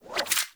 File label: Serpent Ability Tendril Retract; Warframe; Warframes; Alchemist